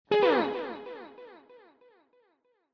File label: DELAY